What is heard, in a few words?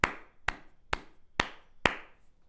clap five times